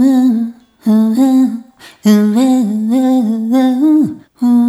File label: Rhythmic A